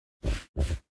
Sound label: scenting, sniffer; minecraft; mob